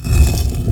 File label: temple door open; gesource; doors; temple classic